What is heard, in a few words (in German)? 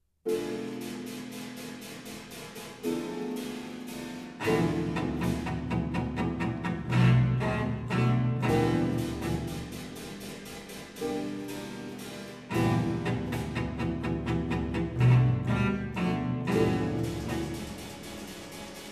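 Vier Hymnen IV. für Violoncello, Kontrabass, Fagott, Harfe, Cembalo, Pauken und Röhren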